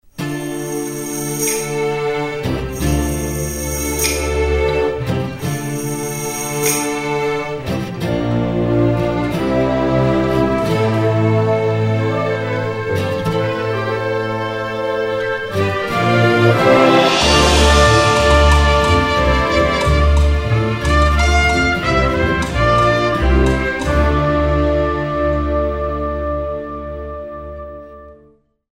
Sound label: orch